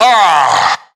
ah, Voice ooh ahh

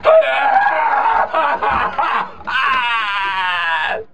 laugh maniacal; ooh ahh

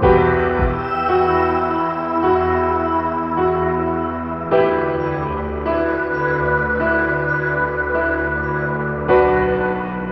piano and keys, Sad Loops

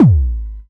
ferec, Kicks Electro

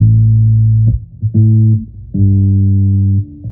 bass, Loops Phonky

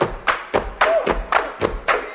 stomping clapping; Drum loops

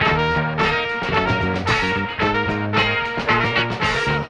horns, loops

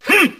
"huh"

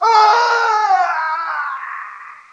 scream male

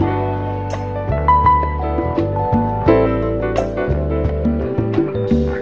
piano, Loops; Phonky

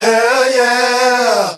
hell yeah SUNG

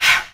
breath

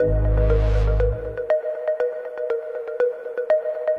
alertion, Electronic Loops

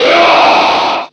growl male; ooh ahh

